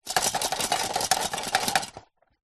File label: plastic shards broken thin pieces shake in container; foley